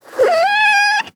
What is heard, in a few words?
Kitten Meow; افکت صدا حیوانات; Animals